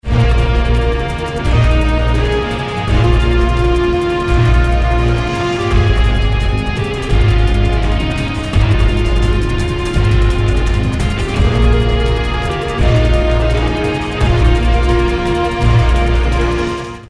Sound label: promotion; planetside